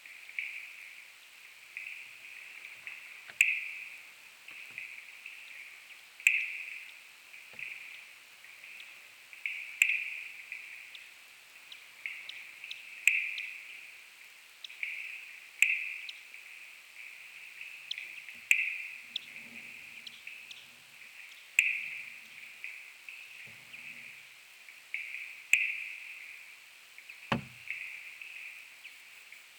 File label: P sardus probable; Sardaigne; S-Giosa; Acoustic Ecology of European Chiroptera